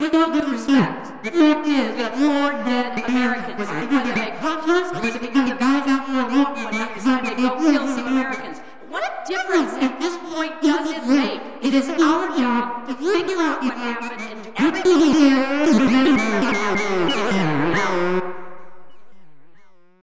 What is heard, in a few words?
drum